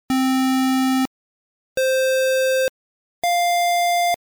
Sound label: square